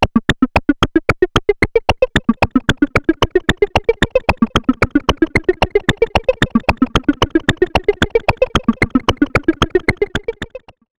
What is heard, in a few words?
Behringer Space FX Korg Volca Modular Delay